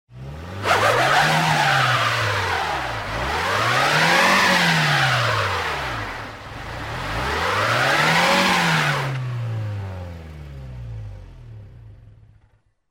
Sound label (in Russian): kolesa rasskatali sneg do ledjanoj korki